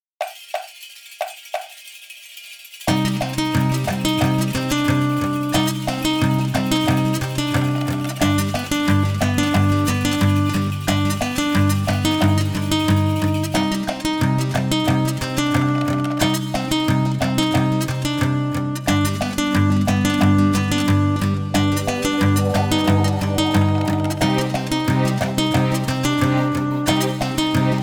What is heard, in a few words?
dune guitar